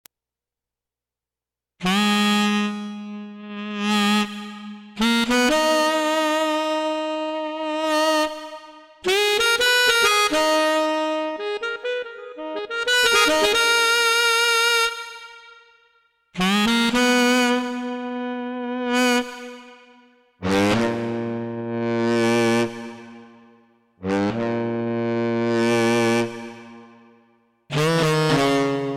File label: SY99 sax